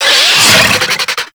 Infested Charger Attack, Enemies; Warframe

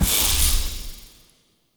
Heal; Powers; Banneret

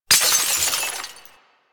Glass Shattering Enemy Shatter; DE; Warframe

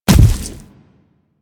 Step Default, Footsteps; Enemies; Warframe; Infested; Grey Strain; Splitter

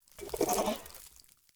Fawn Idle Chatter; Encounters; Animal Rescue

